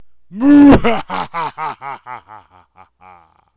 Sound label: moohaha